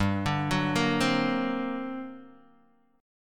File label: G Minor Major 11th, G, Guitar, DADGAD